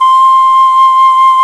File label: PICC; Roland - LA Composer vol. 1; WOODWINDS